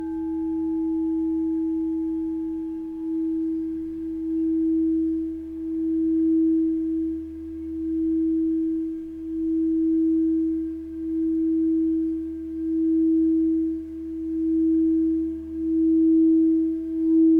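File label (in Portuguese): FOSCA E3, instrumentos de quartzo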